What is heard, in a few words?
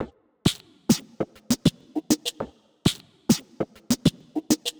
Side chain gated Topline (100BPM); musicradar